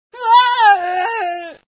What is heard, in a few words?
scream, female sci